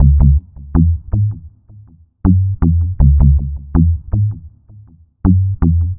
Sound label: Dub Bass, Electric Bass; Dub Bass Strategies; Sonic Foundry (Sony Creative Software); Leo Cavallo Bass Taster